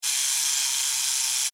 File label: Scifi Servo G Mechanical Robot Small Motor Toy